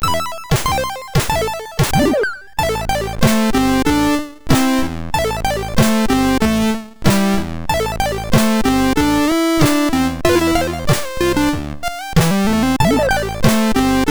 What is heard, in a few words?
sid MOS6581R2